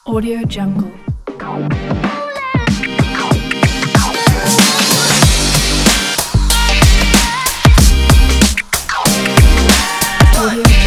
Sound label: for hiphop